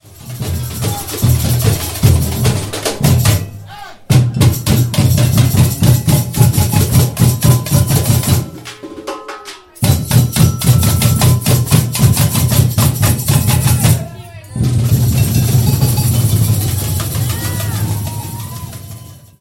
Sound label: Samba End break; rhythms